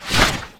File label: nightstalker movement; physics